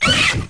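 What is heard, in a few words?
dino damage; Dino Crisis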